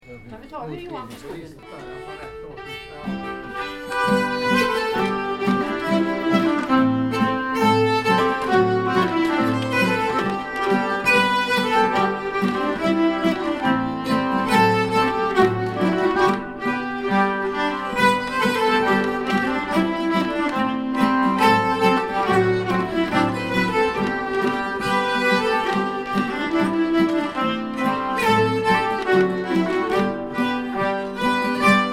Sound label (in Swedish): schottis G; Hoeggeroed